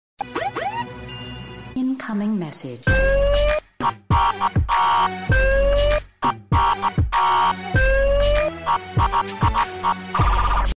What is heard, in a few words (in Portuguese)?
Chegou mensagem Batidão